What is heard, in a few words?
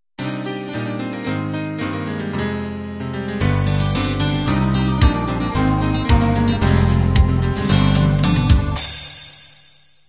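pop 16 beat intro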